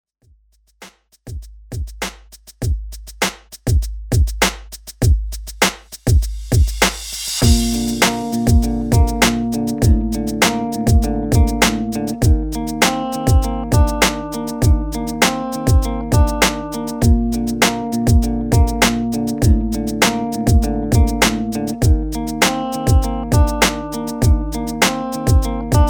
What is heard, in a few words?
Pop Rock; Redrum